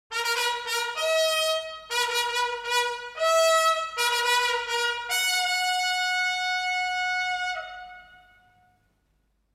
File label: tattoo